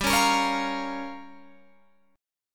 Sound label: G Minor 7th Flat 5th, Guitar, Open G